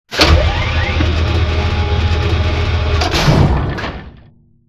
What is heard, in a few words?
conveyor close; corporate; spectre conveyor; Titanfall 2